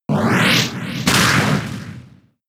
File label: Slam; pokemon sfx gen 6 attack moves xy oras